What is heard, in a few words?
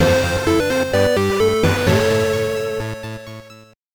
Puzzle Solved; Alwa's Legacy